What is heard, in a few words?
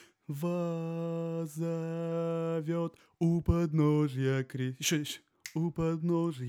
bass